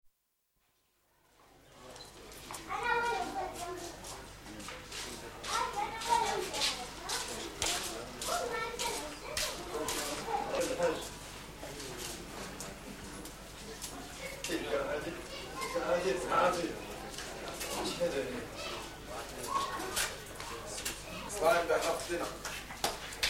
Morocco: Meknes, street atmosphere with echoey footsteps; City Sound Effects; BBC